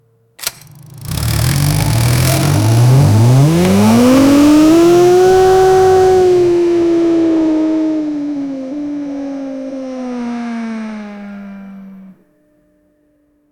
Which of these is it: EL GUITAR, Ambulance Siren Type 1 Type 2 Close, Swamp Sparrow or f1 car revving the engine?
f1 car revving the engine